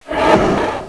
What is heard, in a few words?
gonome pain